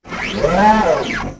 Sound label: servomove; weapons; galak